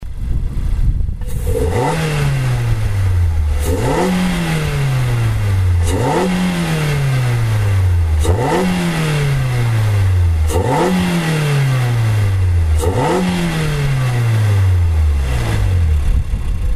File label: Miata MK4 sound; Intake; AutoExe